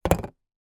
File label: toy magnetic drawing board pen down on board